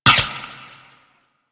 Ricochet; Warframe; Cowgirl

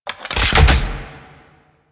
Reload, Rifle; Weapons; Warframe; Thanotech; Trumna Prime

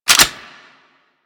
Swarm Rifle Reload; Warframe; Infested; Shock Swarm Rifle

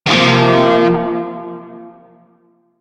Shawzin Hirajoshi Chord I; Music; Warframe; Narmer Shawzin

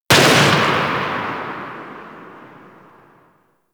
Sniper Rifle Tail; Grineer; Gorg

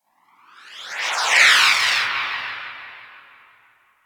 Portal Random D; Crp Exterior Gln Interior

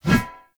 Shield Whoosh Short; Weapons; Enemy; Shields; Sinecure Shield

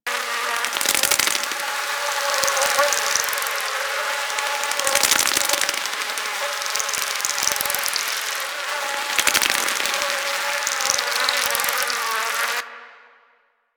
Swarm Loop; Uzi; Warframe; Infested

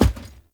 Heavy Grunt Boot Walk Wood, footsteps; Characters; Enemy; Sinecure

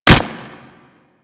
Step Snow, Footsteps; Warframe; Kubrow

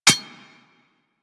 Bullet Impact Generic Metal; Surface

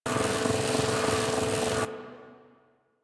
Decoy Loop; Warframe; Loki

